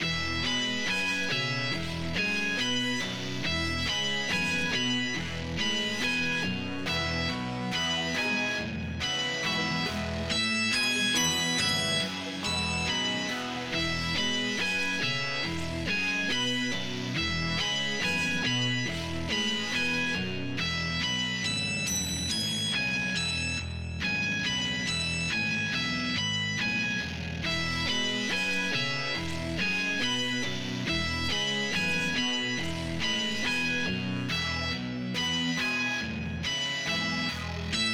youthful elf seeking adventure

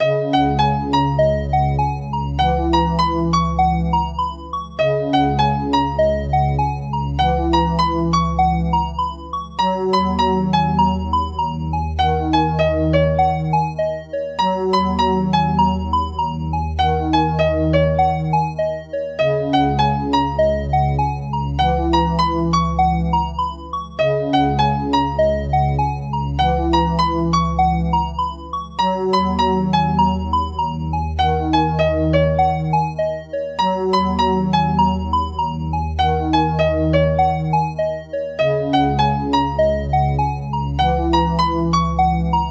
Menu Song; Calm and simple title music

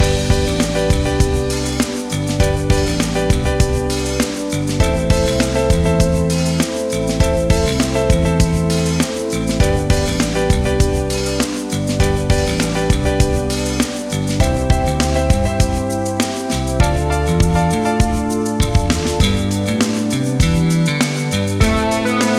racing game ending bpm100

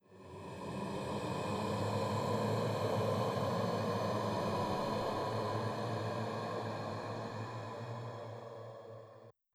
Mysterious Sound

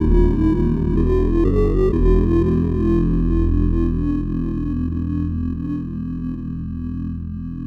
Game over jingle

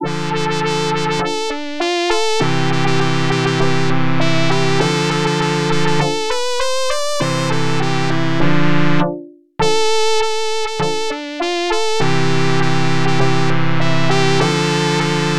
OPL2 Drunken Sailor

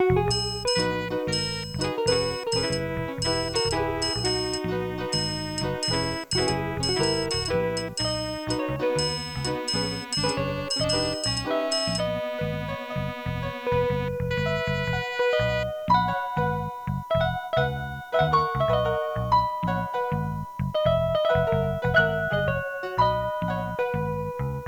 jazz crash

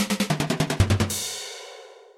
drums, drumming

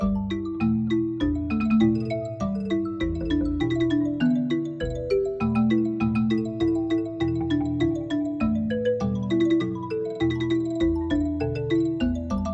bamboo blitz solo

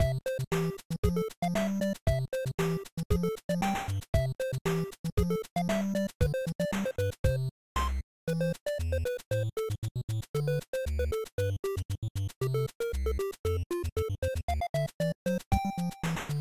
platformer, overworld theme

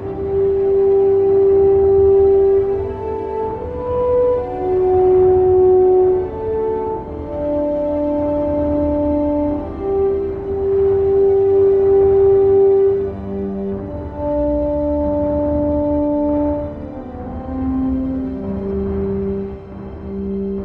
building a colony loop

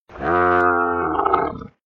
fantasy desert camel

